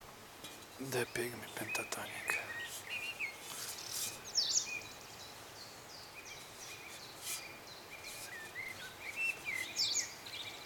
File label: D pygmy